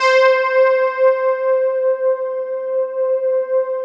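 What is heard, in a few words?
keyboard PAD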